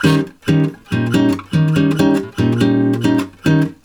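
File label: D# 7 guitar, Brazil Bossa; USB Soundscan; AKAI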